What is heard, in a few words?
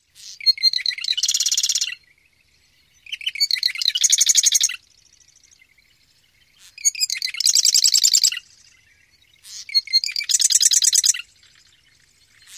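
song eastern subspecies, bird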